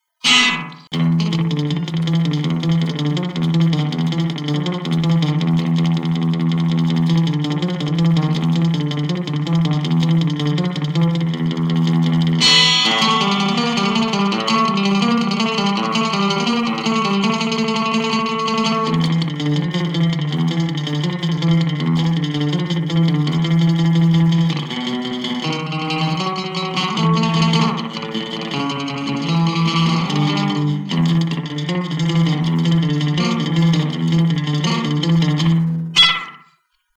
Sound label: delay